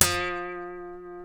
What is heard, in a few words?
G, funk guitars